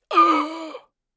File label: death gasp pained gasps short inhales